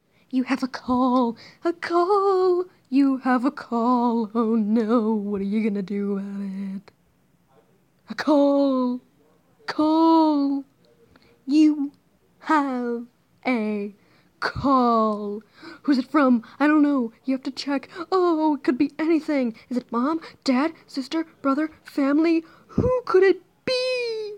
You Have A Call

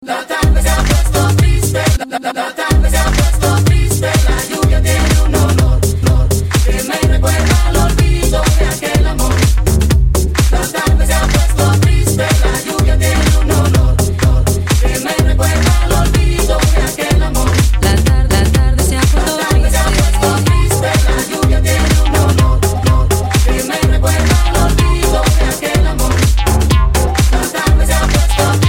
Club Mix

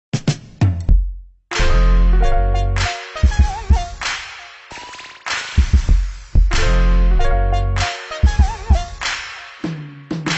Funky

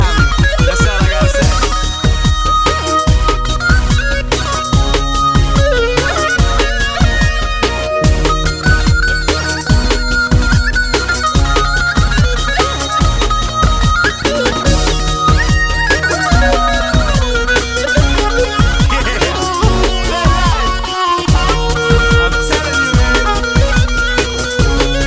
Armenian Elektro

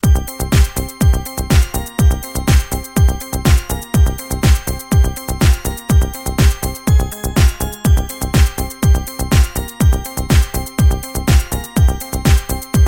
Techno pop

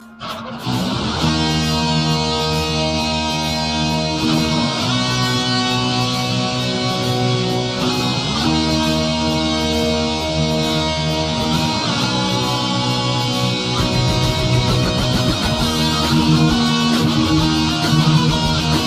Rock

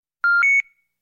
Radar

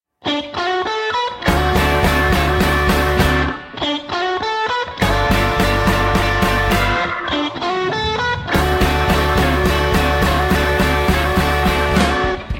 Message Rock

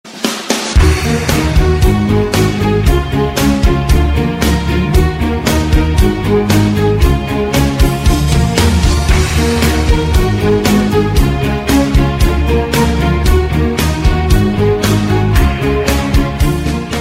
Victory Tune

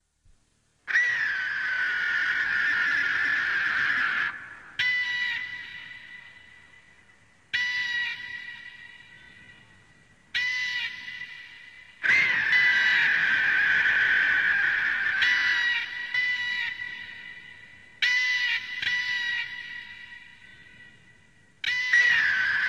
Buzzard

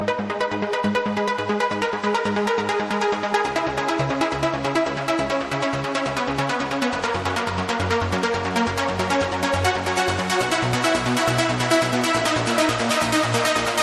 Uplifting trance

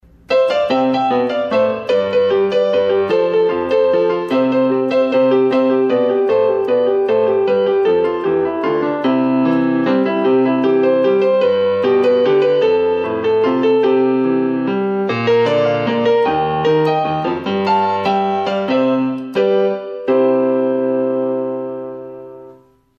Piano improvisation

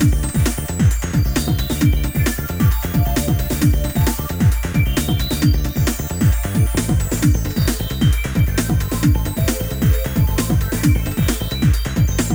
Groove; Groove Room

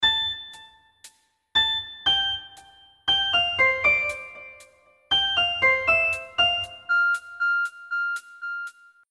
Samsung Tune

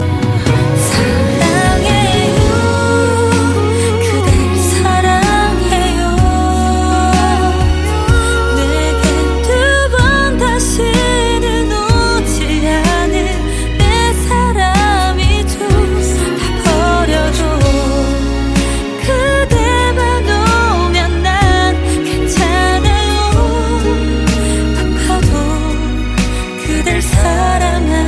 Romance

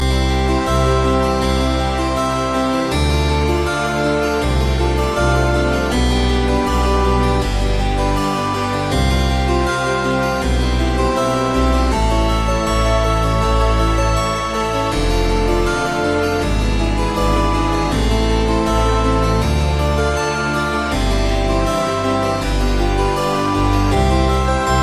Baroque Music

